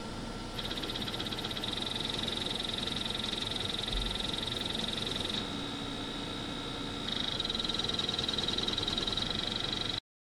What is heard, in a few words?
rk05 seek